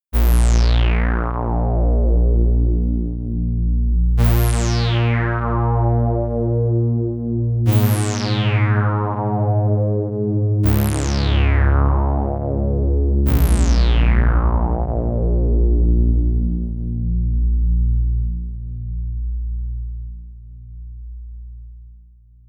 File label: pw sweep